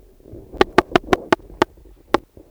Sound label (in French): poussin alba